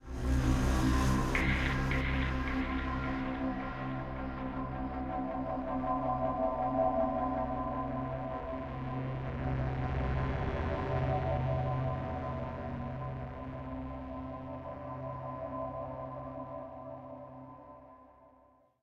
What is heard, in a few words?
Wolf Oneshot; Sniper Ghost Warrior 3 2017